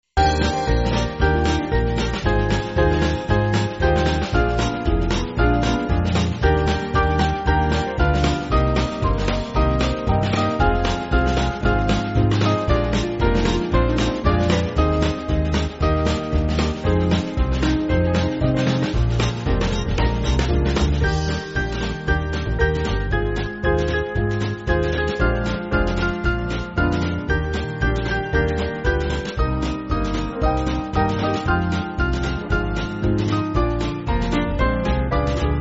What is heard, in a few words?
Band